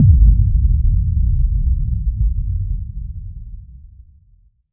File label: Kick FX